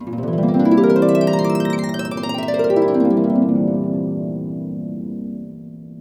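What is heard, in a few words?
HARP GLISS UP DOWN; InVision Interactive; Lightware VOL-1; Instruments & Percussions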